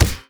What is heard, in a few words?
rubber small